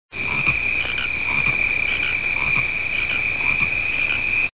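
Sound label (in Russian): сверчок звонкий резкий громкий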